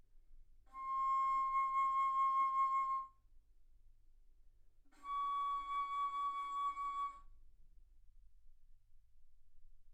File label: Bass Flute ff C6 Db6